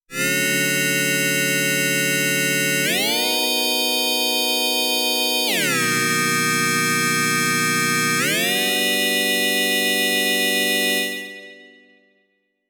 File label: Tube; Sinevibes